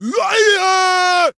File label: vox horrify; nazeebo